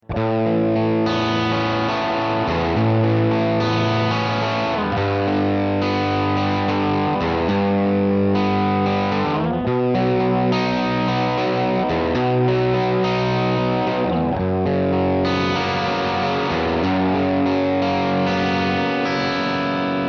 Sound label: chomp